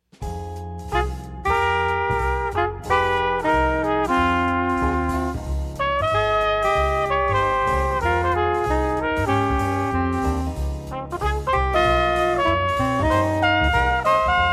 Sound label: Duet